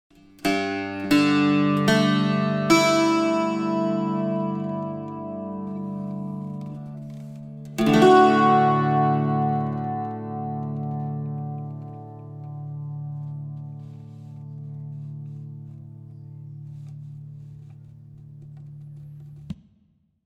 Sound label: bouzouki d chord